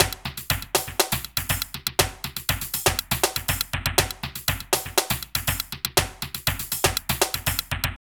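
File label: drum loops, right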